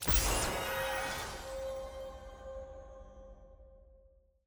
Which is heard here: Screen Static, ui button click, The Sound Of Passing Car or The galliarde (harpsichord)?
ui button click